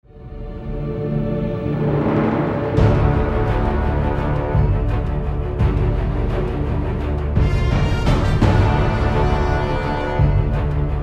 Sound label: epic ver